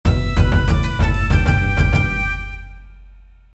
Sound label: Jingle Game Over; Katanuki; dsiware 2009 nds gamerip